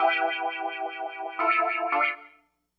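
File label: synth, Breakdance 172 B minor; Big Fish Audio; Hardcore Breakbeat Action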